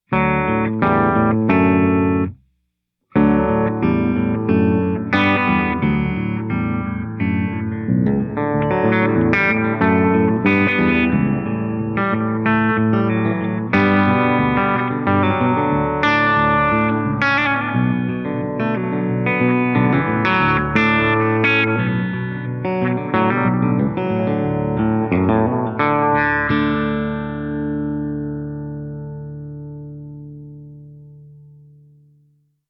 Delay Filtered Feedback Baritone

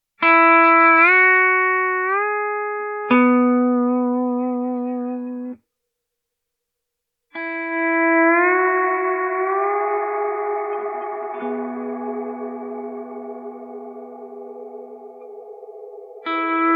Ghost Pedal Steel, reverb

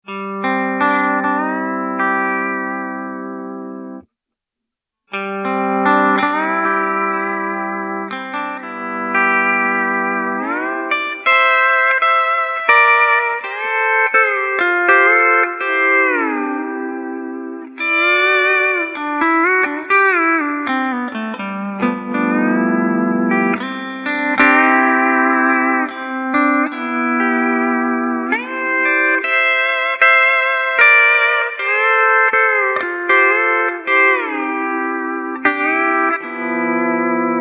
Plate Classic Dark Pedal Steel, reverb

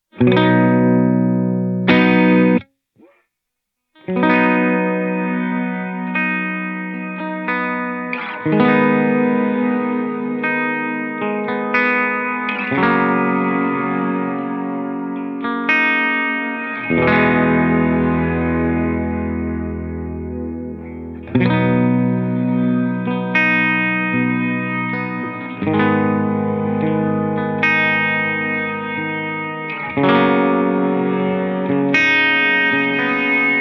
Reverse Decay Reverse With Decay, reverb

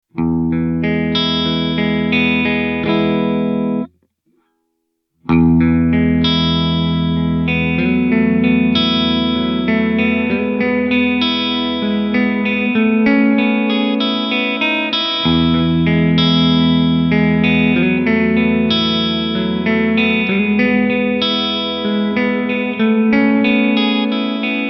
Ambient Swell Picking, reverb

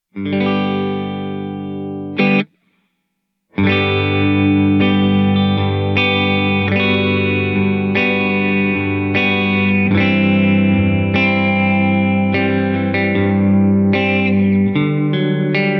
Modulation Flanged Reverb Fast

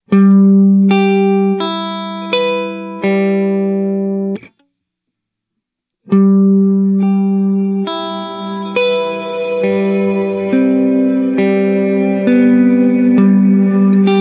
Reverse Decay Big

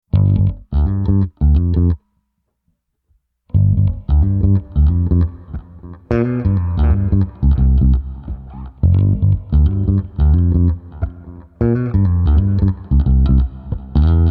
Delay Single Tap Bass

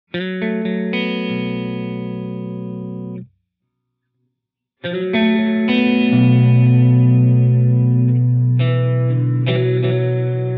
Spring Dark Baritone Long Wet, reverb

